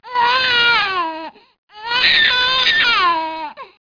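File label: baby cry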